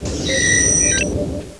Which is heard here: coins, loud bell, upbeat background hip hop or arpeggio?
loud bell